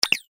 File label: Media Click